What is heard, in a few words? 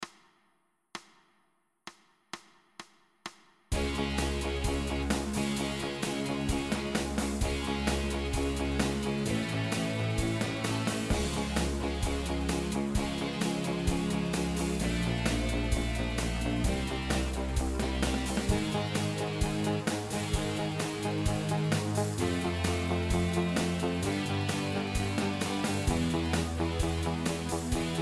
backing track 130bpm